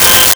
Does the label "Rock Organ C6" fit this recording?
Yes